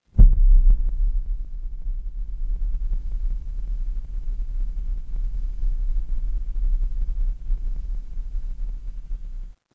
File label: ambience room tone